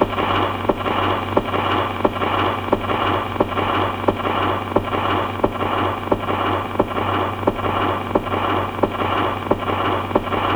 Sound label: record end